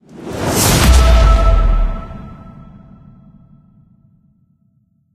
UI LevelUp; Animus Stand Alone; Android, iOS, Mobile

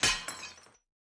Break Ceramic

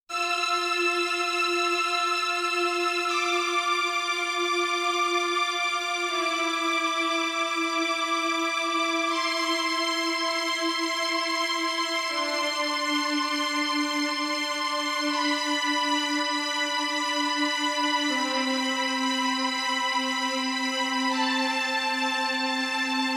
Strings Version

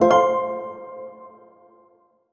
Game Start

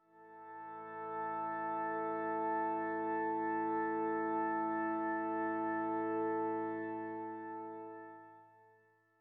Church Organ; Grindstone

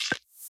Task Notification Small

